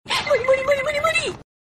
Nada Dering Anime; Nobara Kugisaki

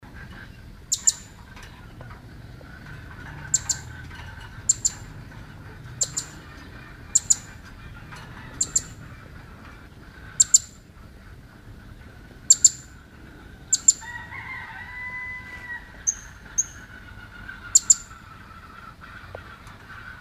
Suara Burung Kenari Penarik Kenari Betina; burung Kenari terbilang menjadi jenis burung kicauan yang cukup populer

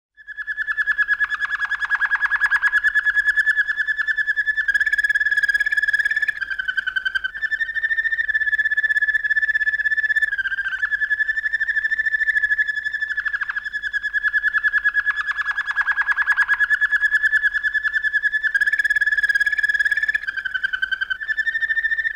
Suara Kenari Roller Jerman; burung Kenari terbilang menjadi jenis burung kicauan yang cukup populer

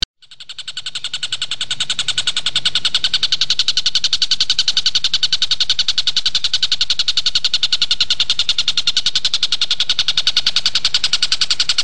Suara Burung Tengkek Buto Gacor Jernih

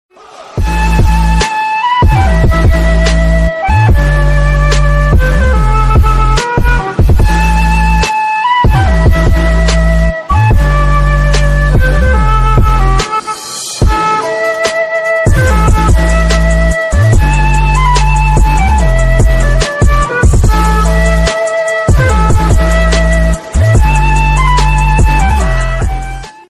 Nada Dering Instrumental; Naruto

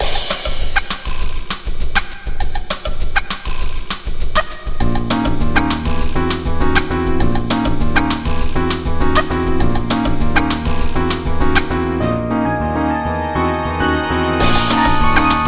Nada Dering Nokia Jadul Sizzlin

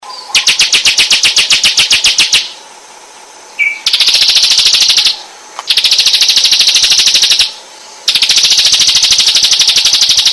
Suara Burung Ciblek Full Buat Isian Lengkap

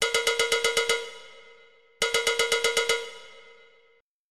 Nada Dering Nokia Jadul Enigmatic

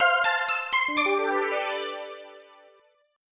Nada Dering Nokia Jadul Alarm

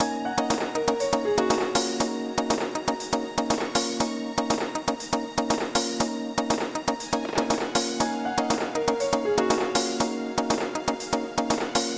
Nada Dering Nokia Jadul

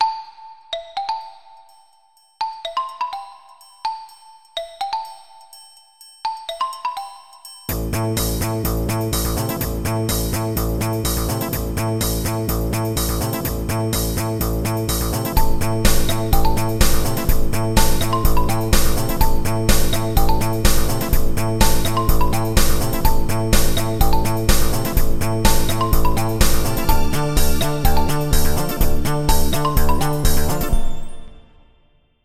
Nada Dering Nokia Jadul

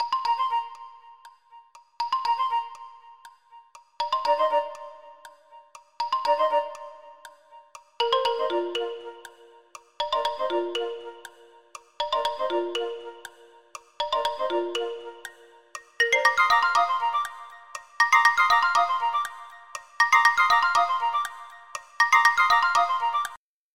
Nada Dering Nokia Jadul Clock alert

